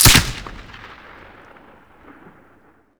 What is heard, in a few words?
bulldog 1shot